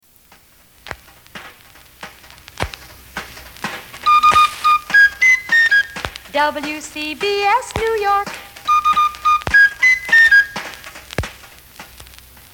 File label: old NY sung; wcbs